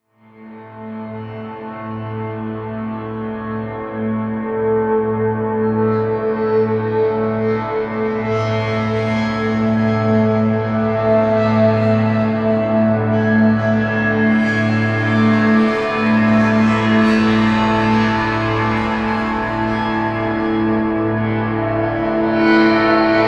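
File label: majestic brightness, pads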